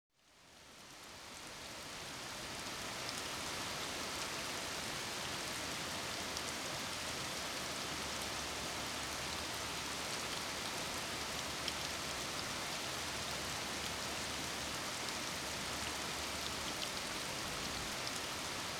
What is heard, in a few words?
rain & thunder; med rain; light thunder rumble & water drips